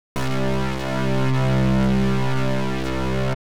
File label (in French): Ligne de synthé sans effet de Sidechain